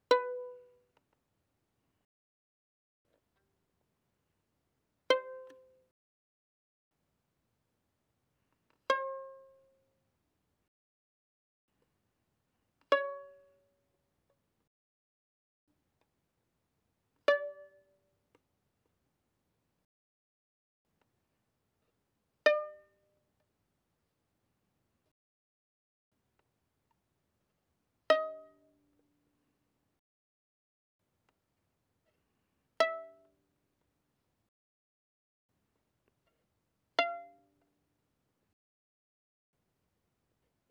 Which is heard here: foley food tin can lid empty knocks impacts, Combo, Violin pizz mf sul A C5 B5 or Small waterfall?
Violin pizz mf sul A C5 B5